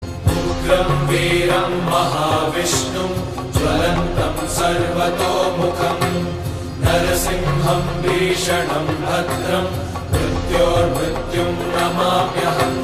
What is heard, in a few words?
chant; ugram veeram